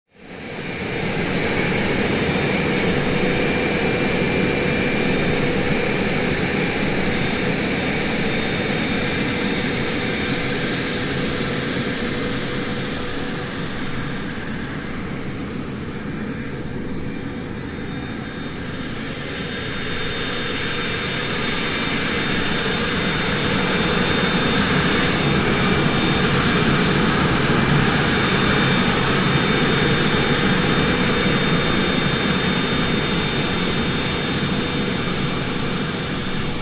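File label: Marchetti Slow Circle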